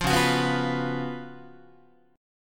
Db 11th, Guitar; strummed; JGuitar

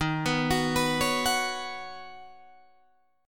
D sharp Minor 7th Sharp 5th, Guitar, Drop D

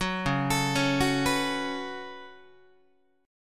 C sharp 7th Suspended 4th Sharp 5th, Guitar; C#7sus4#5; arpeggiated; JGuitar

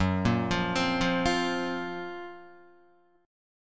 Gb Major Sharp 11th, Guitar; GbM#11 arpeggiated; JGuitar

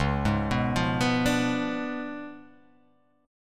Db 7th Flat 9th; Db7b9 arpeggiated; JGuitar

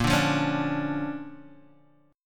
D Minor Major 7th Sharp 5th, A sharp

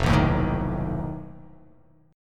F 7th Flat 5th F down

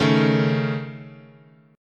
C sharp Suspended 2nd Sharp 5th, chord

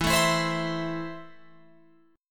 E Suspended 4th Sharp 5th, Guitar Open F; Esus4#5; strummed

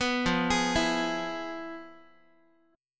F-Minor Major 7th Flat 5th, Guitar; FmM7b5 arpeggiated; JGuitar

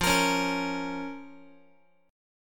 F Suspended 4th Sharp 5th F, Guitar; Fsus4#5; strummed; x,13,13,11,14,x

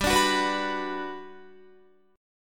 G Major 7th G down Guitar; Listen to GM7 strummed; GM7 Chord

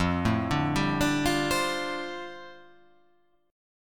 F 6th Add 9th F down, chord

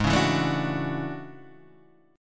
A Major 13th, guitar

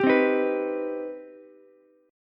C Minor Major 7th, Ukulele; strummed; 12,12,11,14; JGuitar

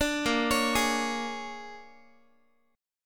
A sharp 7th Sharp 9th A sharp down Guitar; A#7#9; arpeggiated

